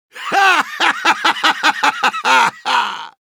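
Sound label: laugh happy, Russian; Soldier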